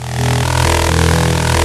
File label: Cyber Pan, Lead; Digital Sound Factory Xtreme Lead